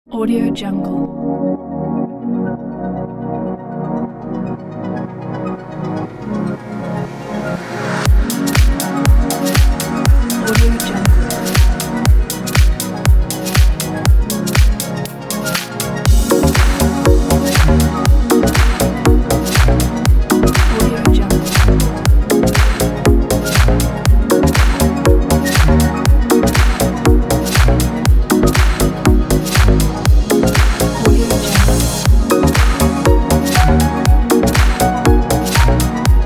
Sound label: background dance house